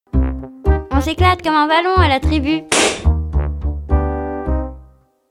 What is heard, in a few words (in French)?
jingle ballon